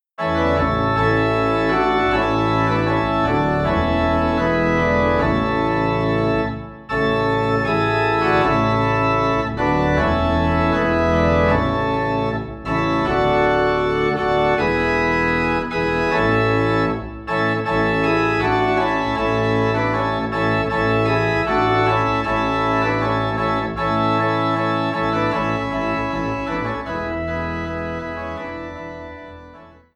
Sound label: Joy To the World - Organ Low in D; christmas